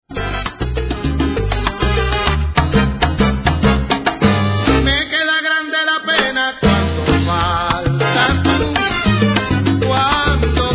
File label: salsa